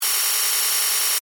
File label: Data Hard Drive Beeps, Readout, Scifi; Tech